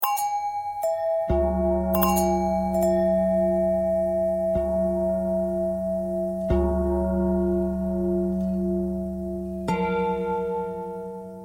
bell long bell thread pentatonic

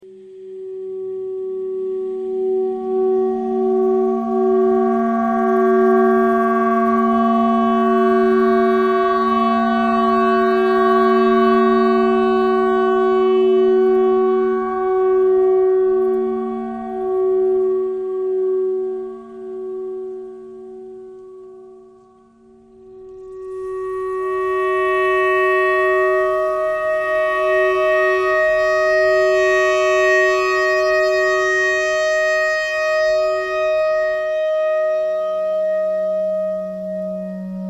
clarinet clarinet notes e noise

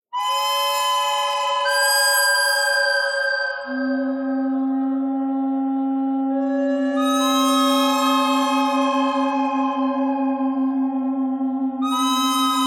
bird thread pentatonic; noreverb

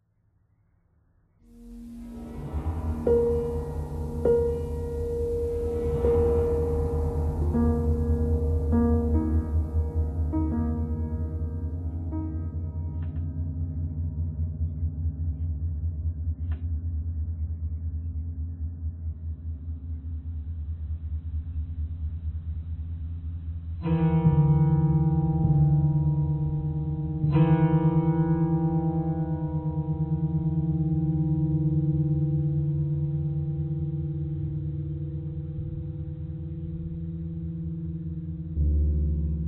piano thread noise

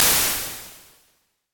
glimmer; patatap

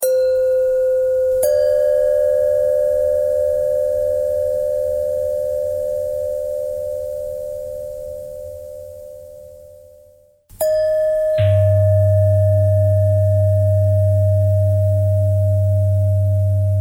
bell thread bend thread

